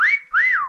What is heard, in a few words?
whistle; meccha chameleon